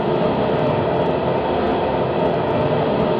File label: Run, Train; OpenBVE